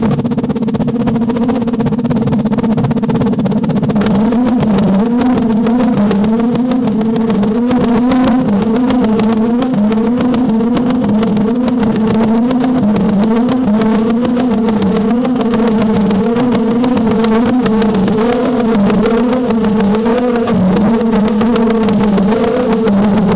sounds of formula 1 in, race car

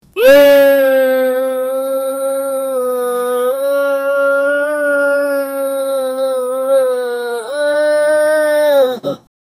bear crying in pain

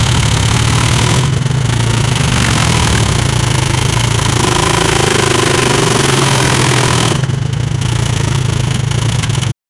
motorcross offroad engine, acceleration

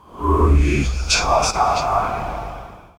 dark ritualistic chant, cultist

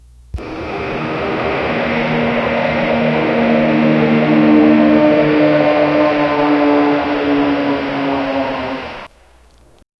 helicopter engines roar; arctic

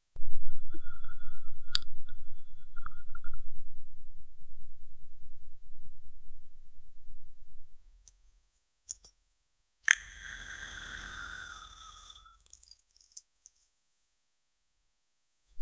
beauty facial esthetic

